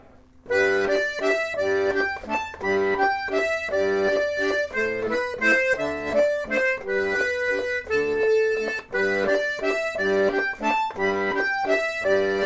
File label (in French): valse